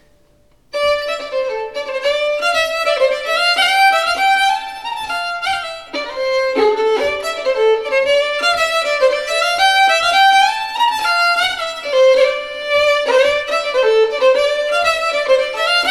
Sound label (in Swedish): Harjedalspolskan